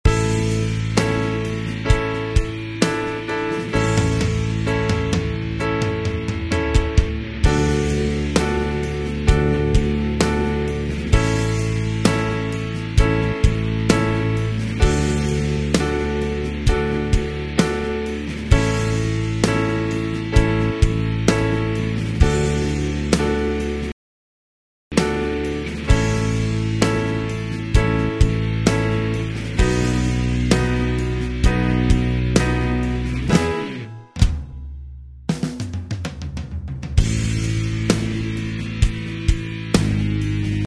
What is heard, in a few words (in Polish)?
backing